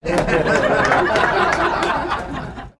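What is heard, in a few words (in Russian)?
звук толпа смеется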